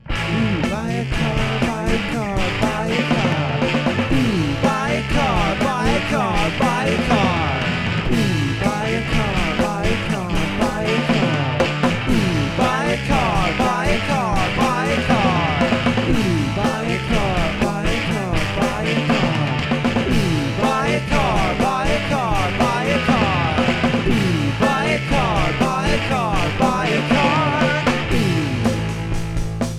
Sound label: 30 second car commercial